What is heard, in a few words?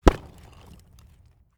shale break low end crackling rock